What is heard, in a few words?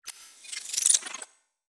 Glitch